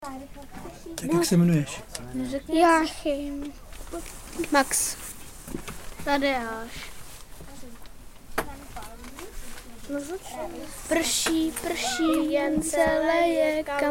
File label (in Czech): slavíci; ornitologický víkend